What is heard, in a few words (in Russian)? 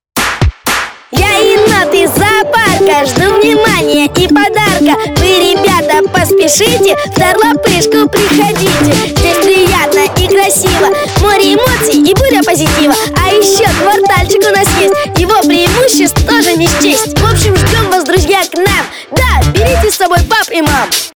vokal